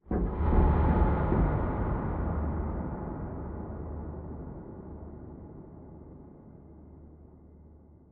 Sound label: Sideways Town Metal Creak; Boomtown; Titanfall 2